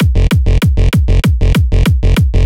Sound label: KICK BASS